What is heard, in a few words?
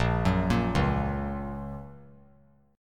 Bb Minor Sharp 5th; arpeggiated; JGuitar